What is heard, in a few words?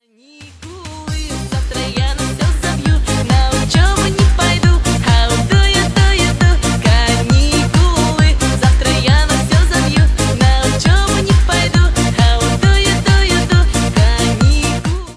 music; cstrike